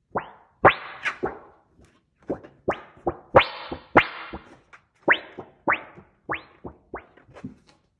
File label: metal plate